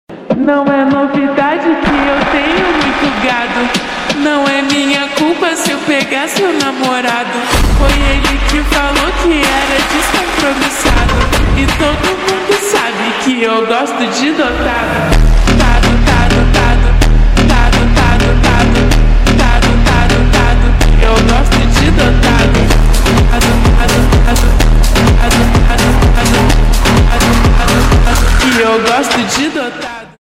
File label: phonk br song